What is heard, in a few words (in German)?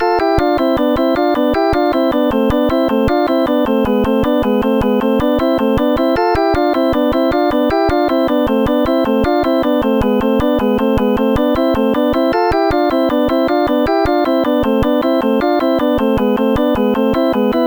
Klassik